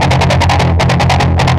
Overdrive, Industrial, Nasty

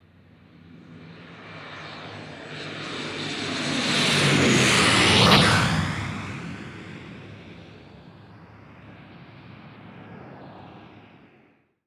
JET; AKAI Anime Sound FX; NATURE

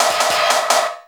Snare Fill; Sonic Foundry (Sony Creative Software); Esoterik Beatz; PH7 FX

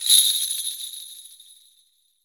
SUZU; AKAI S-Series CD-ROM Sound Library VOL-5

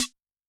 Ana Air, Yamaha DTXpress-II snare

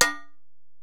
Ac Perc, Drum Machines Alesis DM-PRO

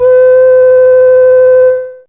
Ooo C4, Fairlight CMI IIe

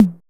606 tom 1, TR-606; Drums In Your FACE!

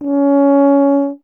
BRS TUBA, Solo Instruments

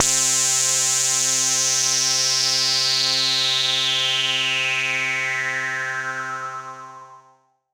PAD C4, Yamaha CS40M synth pad; Pads

